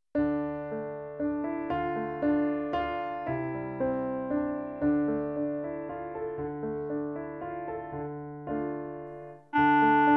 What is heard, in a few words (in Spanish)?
CLARINETE; Scarborough fair